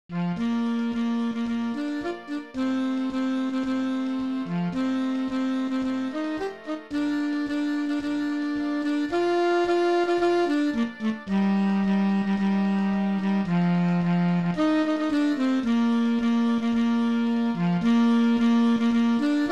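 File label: alto sax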